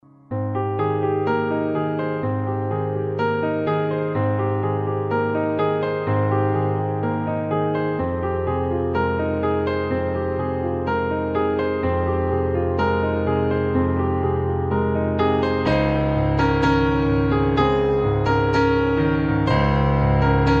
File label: slow morning alarm